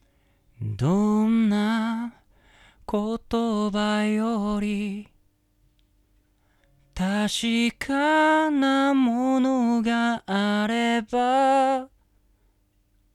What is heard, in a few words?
Audio Technica ATM25